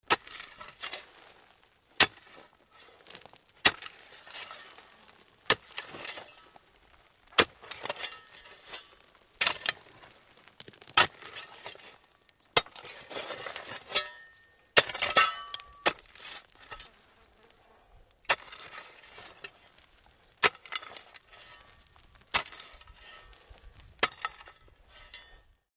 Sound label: Shovel Dig Dirt Fast D